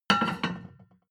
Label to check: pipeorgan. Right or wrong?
Wrong